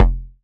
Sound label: BAS F1, Yamaha TX81Z synth bass